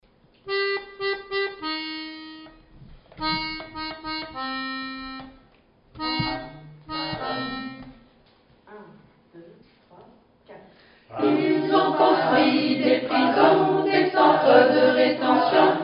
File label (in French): Nemedemandezpas tutti; Paroles : Bande à Rosa (Chorale d'Amiens), sur l'air des Archers du Roi